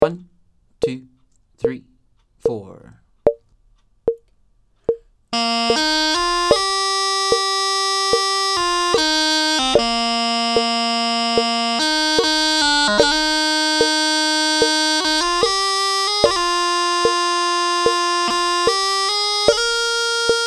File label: pipe section, 74 bpm